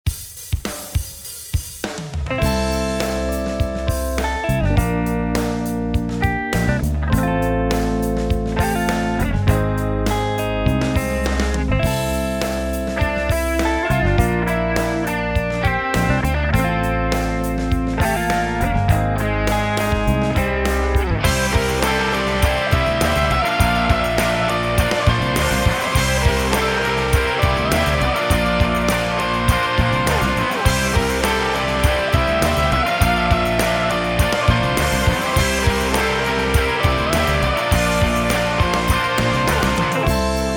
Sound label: Funky Styley Rock; Cues for Film and TV